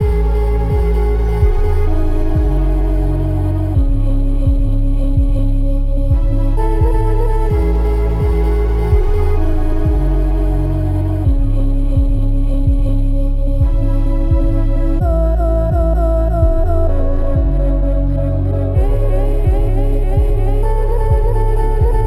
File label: 128 BPM C# Min, Melodies; Codex; Cymatics; Immortal Production Suite Beta